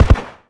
polycraft 3 shot far

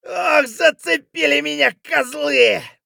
hurt light; Escape from Tarkov

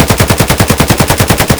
akm close loop; Escape from Tarkov

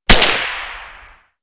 Inf Weapons; Red Orchestra 41-45

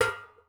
ui drop metal thin hollow; Rust

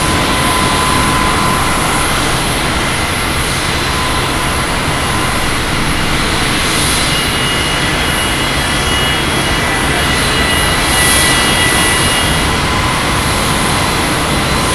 jet engine close

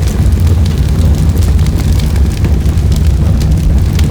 fire huge

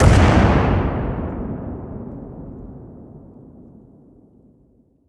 impacts; World of Tanks

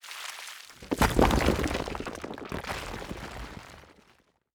quarry dump rocks; Rust

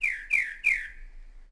birds karelia; ambient; World of Tanks Blitz

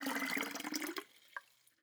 water bottle fill container; Rust

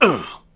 Hit Vox; Civ4 warlords

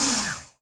P-51D Cockpit Actuator Coolant End; DCS

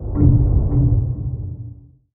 submarines engines; World Of Warships

